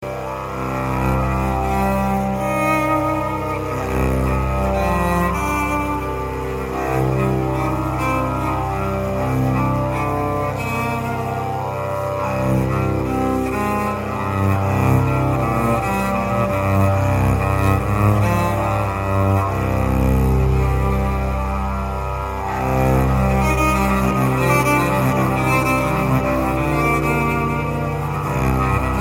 cello ringtone; cello class

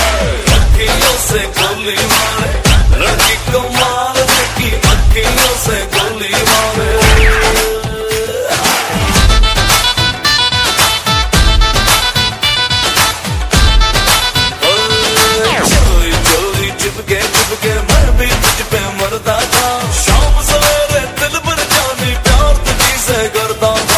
love bollywood ringtone; bollywood ringtones

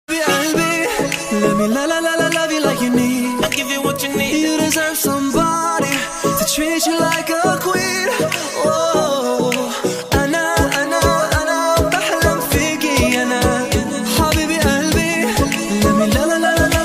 romantic music ringtone, bollywood

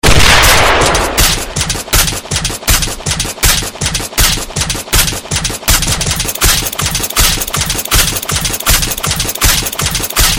gun effect ringtone

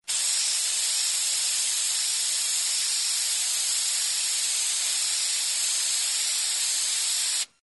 spray paint ringtone

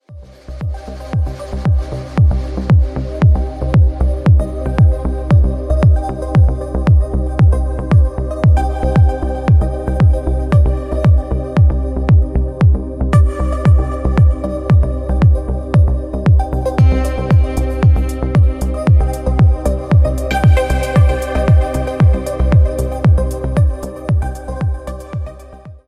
club song; galaxy ringtones

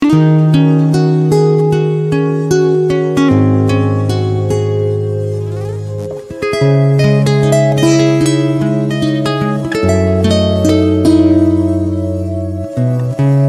instrumental guitar ringtone

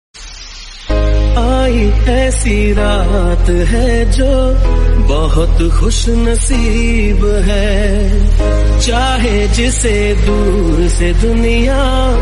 bollywood hit song tone ringtone

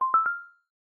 acorn galaxy sms ringtone

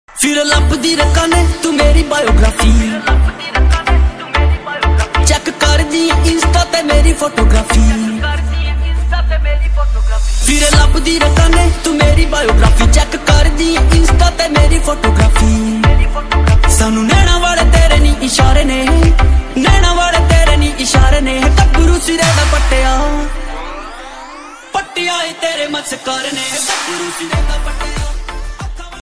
punjabi song ringtone; bollywood ringtones

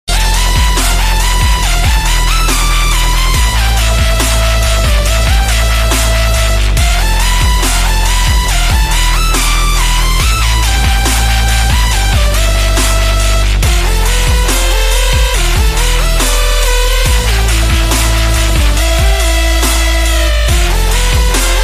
dubstep ringtone; for samsung

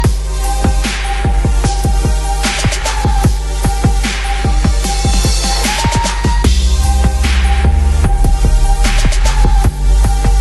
top popular ringtone, english ringtones

